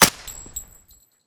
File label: uzi silence; Tacz1.1.5+; RSWS 1903